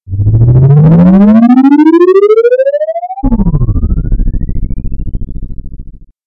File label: spaceship